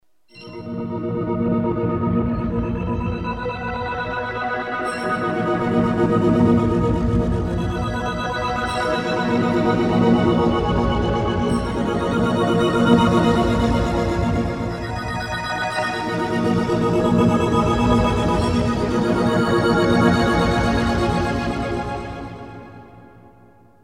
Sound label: pad, roland jv2080